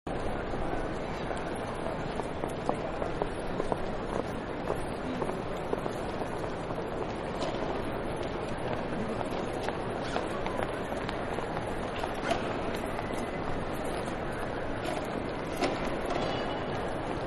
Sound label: Train Station Ambience; Trains (Sound Effects:Vehicles); Manhattan Production Music